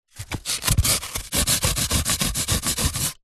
foley polystyrene piece rub against another; zapsplat

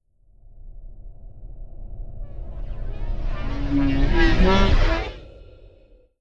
Whoosh Twisted Vocal; bottlerocket

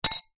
household teaspoon drop in bowl

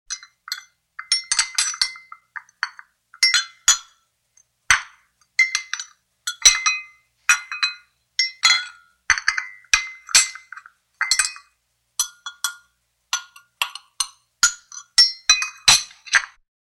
cup and saucer clatter; household; sound effects; sound effects one

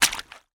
footstep water ice puddle deep; foley

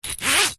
trampoline net zip open or close fast; sport; zapsplat

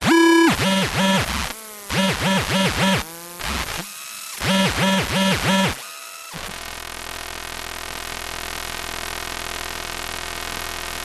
Cnc Wood Carving Machine; soundbox library

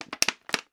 foley bottle plastic movement; sound effects one

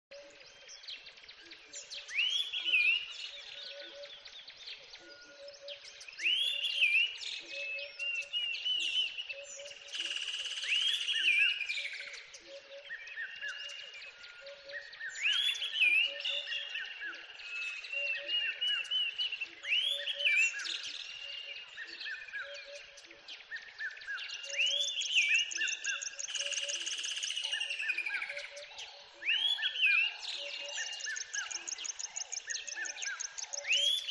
NATURE Tropical River Lively Calm Wildlife; ATMO; smartsound

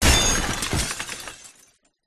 impacts crash smash debris metal plastic glass

